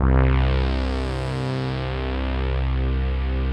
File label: 3 saw sweep C2; Greytsounds; Scott Peers Sound Engineering